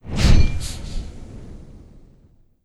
Roasterflameend

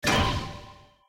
Refrigerator Remove; Obstacle; hello kitty friends match; android

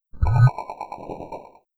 Jellyfish

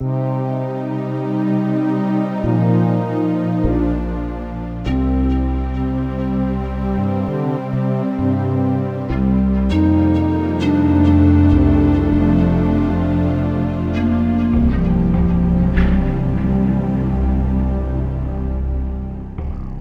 music jingle game over loop; Donkey Kong Country Returns HD

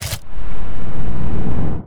Armour Antic

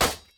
spinner Land Grind

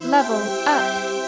levelup; Combo Chaos!

